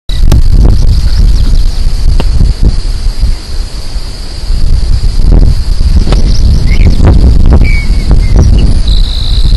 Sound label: Anthus nattereri; Ruta 37; Jorge La Grotteria